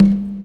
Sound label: CONGA; E-Lab; X Static Goldmine 2